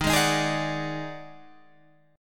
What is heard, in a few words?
D sharp Minor Major 7th Double Flat 5th D sharp Guitar Open G